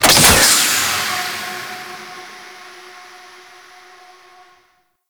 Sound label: Oxygen Pillar Activate; Warframe; Survival